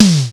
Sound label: ELEC.DRUMS; Crypton - DrumBase5000